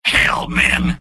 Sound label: soldier negative vocalization; tf; mvm